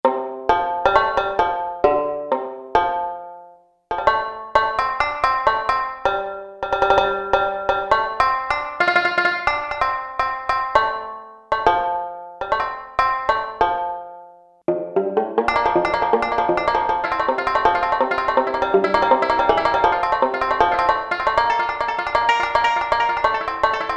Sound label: Banjo, Korg MSC-6S Fretted Insts; Fretted Insts 1